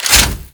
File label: Flame Thrower Reload; Sargas Ruk